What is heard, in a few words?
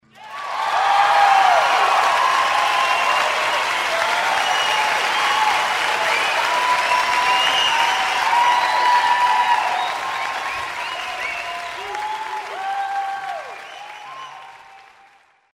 Applause Big; Starlight 2 Intro; Imaging